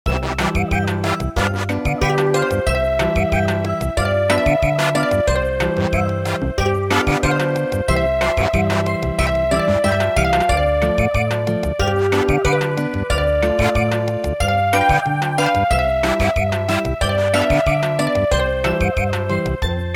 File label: Samba